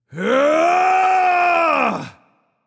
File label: battlecry; smite; poseidon